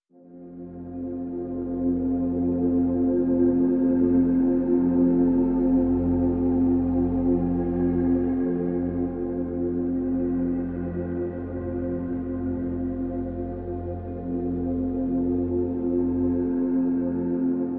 Pads (F)

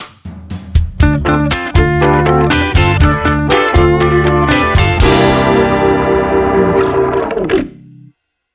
Latin Lover Guitar Marks

